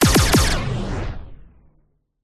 science fiction weapon semi automatic gun shoot x3